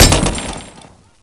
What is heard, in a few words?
he bounce, hegrenade; weapons